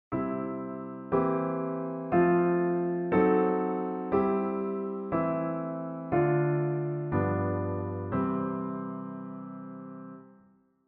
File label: Steinway model D level playing field no EQ